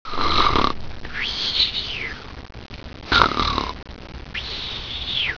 呼噜; 日常生活; 特酷声音素材库

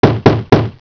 敲门; 日常生活; 特酷声音素材库

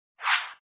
闪; 创维移动+vika 音乐与声效

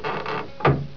关门; 日常生活; 特酷声音素材库

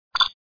闪; 创维移动+vika 音乐与声效

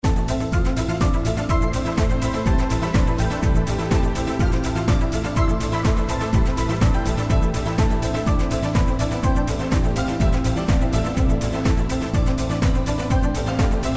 片尾; 创维移动+vika 音乐与声效

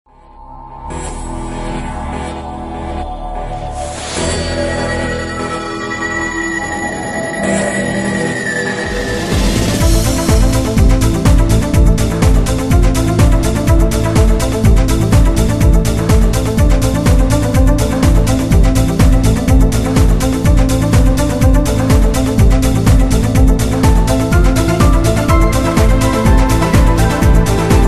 主题; 创维移动+vika 音乐与声效

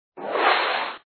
闪; 创维移动+vika 音乐与声效

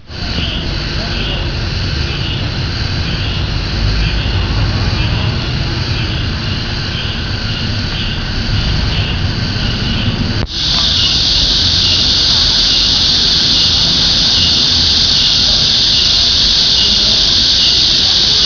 知了; 动物; 昆虫; 特酷声音素材库

